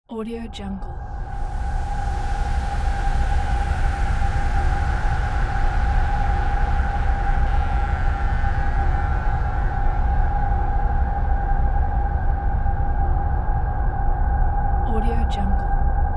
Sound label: earth background atmosphere